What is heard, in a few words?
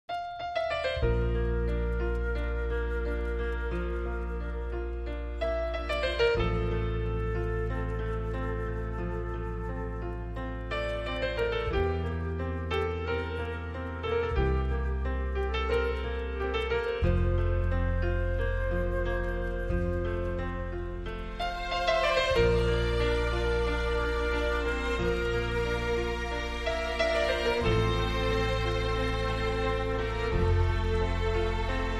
No Drums & Guitar